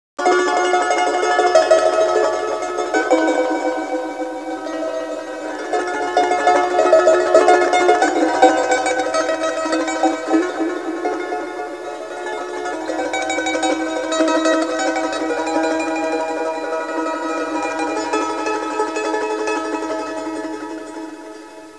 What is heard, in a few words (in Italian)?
musica strumentale